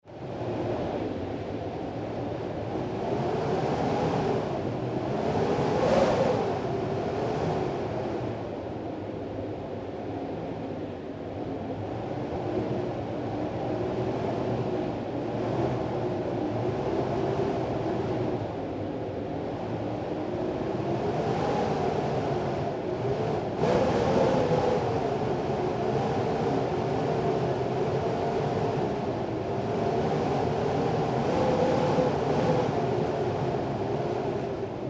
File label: Wind howling and whistling through window